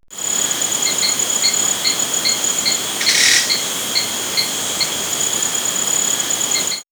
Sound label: Nature, Birds Insect; X-Static Goldmine